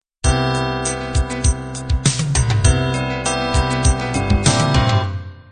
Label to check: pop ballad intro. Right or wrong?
Right